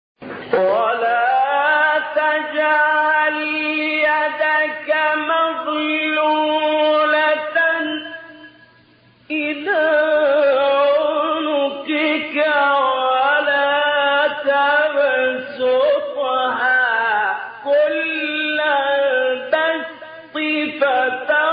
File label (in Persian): تلاوت آیه و سوره اسرا; 30; استاد محمداللیثی